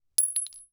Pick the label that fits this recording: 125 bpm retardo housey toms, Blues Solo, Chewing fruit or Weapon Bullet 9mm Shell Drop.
Weapon Bullet 9mm Shell Drop